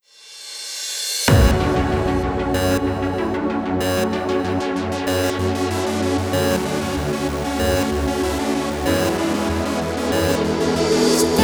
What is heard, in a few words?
Vocal Remix; Dance Dance Revolution Ultimate Dance Collection Mania